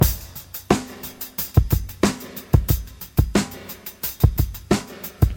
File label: 89 bpm breakbeat sample b key